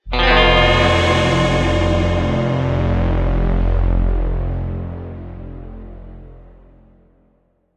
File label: Mission Failed; Fortnite Save the World